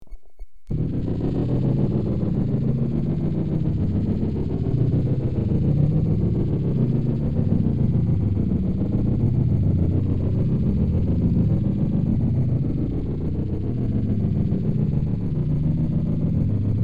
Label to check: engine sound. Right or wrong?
Right